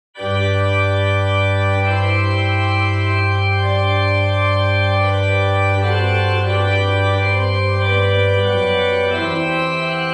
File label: kyrie plein jeu, organ; Messe pour les Convents; Francois Couperin; The organ was built by the Quebec-based organ builders Létourneau